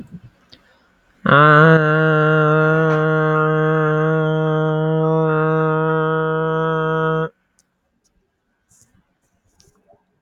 Music Musical instrument Bowed string instrument Double bass, vocal imitation